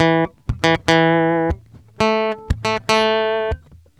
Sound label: GUITAR SALSA